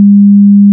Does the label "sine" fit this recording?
Yes